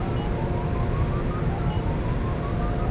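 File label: telemetry loop; Star Wars Obi-Wan; XBOX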